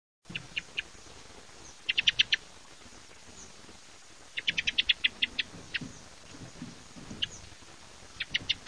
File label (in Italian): TORDO